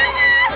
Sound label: car horn